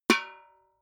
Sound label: metal bucket hit ring small